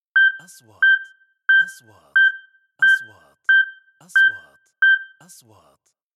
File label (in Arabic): نغمة تنبيه تنازلي; transitions